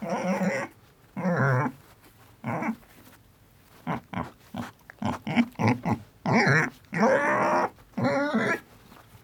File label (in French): cochon